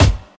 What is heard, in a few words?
smooth snare sample g key, acoustic snare sample tuned to the G note; snare drum samples